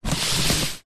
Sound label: foley cardboard box parcel wrapped paper slide